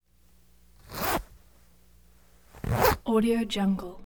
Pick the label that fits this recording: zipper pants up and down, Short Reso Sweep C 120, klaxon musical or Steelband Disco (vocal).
zipper pants up and down